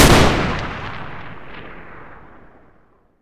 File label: m82 fire, sniper; weapons; garrysmod